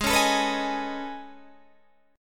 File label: A Major Sharp 11th, G sharp